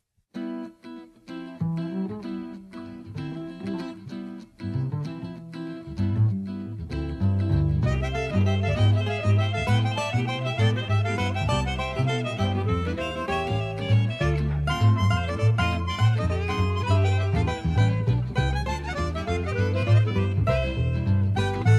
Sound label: Waltz